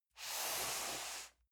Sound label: cardboard box medium slide soft; objekte